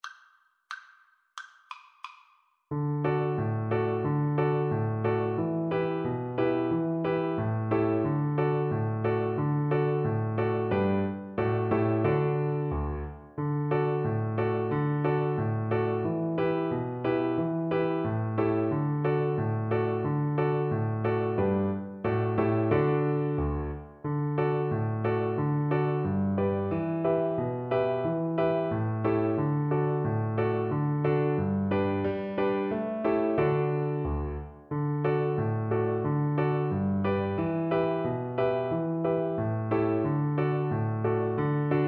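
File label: viola karaoke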